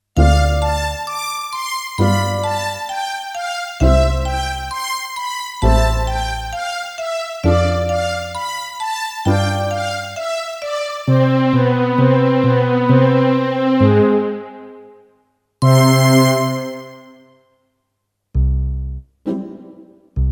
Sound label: jingle bells; big